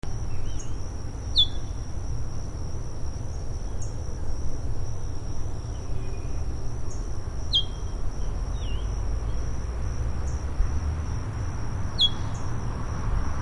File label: streak-backed oriole